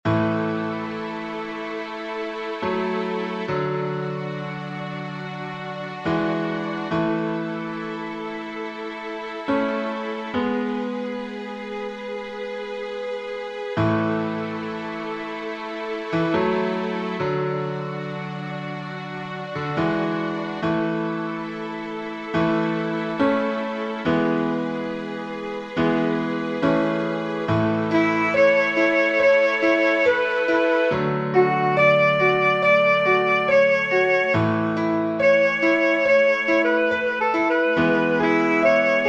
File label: Alto Sax